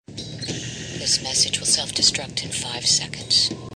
Self Destruct Tone

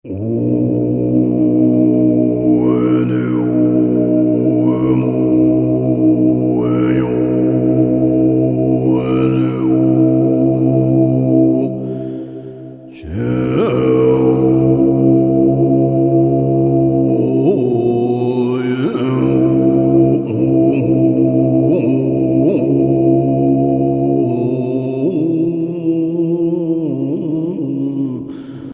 Tibetan Monk

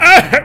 Moan; Zarbon

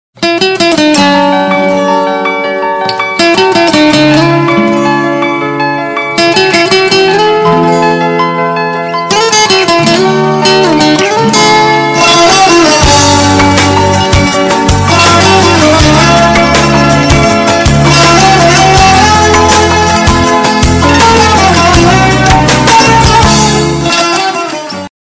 Best Guiter Intro

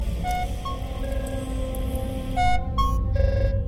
Robot; Planet 51

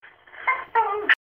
Dog Uh-Oh

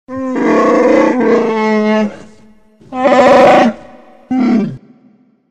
Wookie Tone

